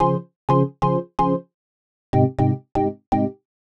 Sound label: Organ chops (125BPM), retro house